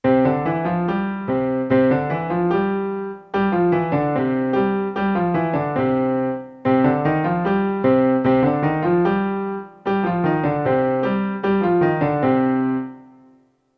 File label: 16th note in C and Cm